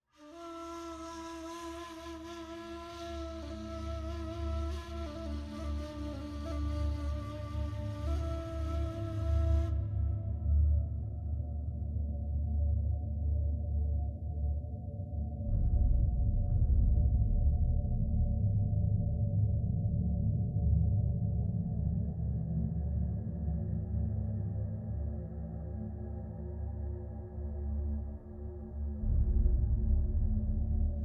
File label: String